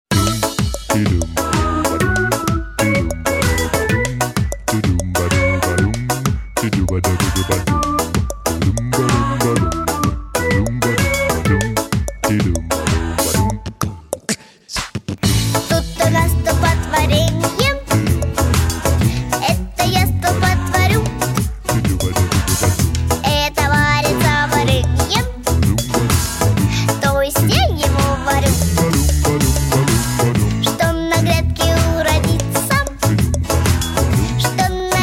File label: music